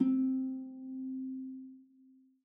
game show quiz bonus reveal

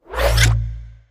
space tile flip

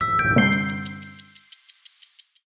gameshow quiz bonus time

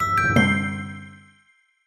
gameshow quiz cheat

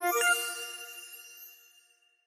winter chip minor

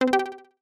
game show menu subtle

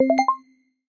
conveyor item centred

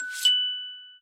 block tile flip

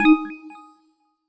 chip minor

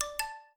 block game menu

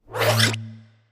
space tile flip

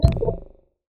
chip fail

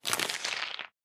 wood tile eliminate soft

